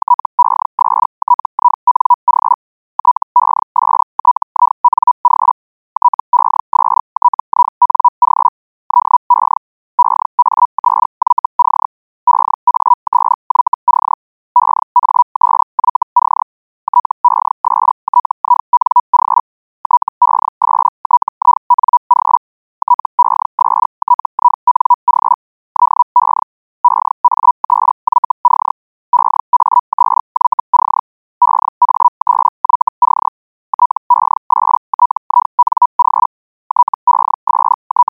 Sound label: hell